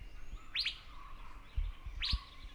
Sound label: fairy bluebird model